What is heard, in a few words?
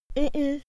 little girl negate mhmh; soundpool